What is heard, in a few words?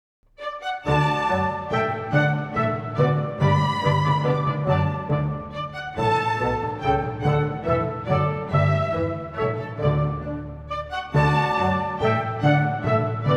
german dance in d